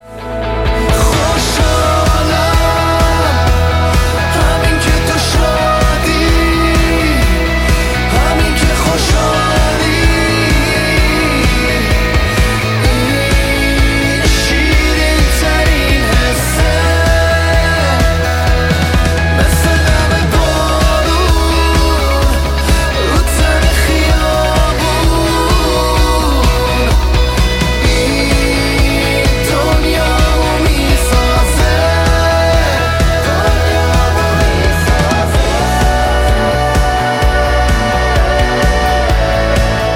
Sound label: Ringtone